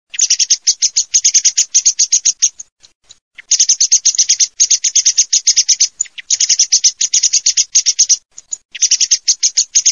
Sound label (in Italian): SCHIAMAZZO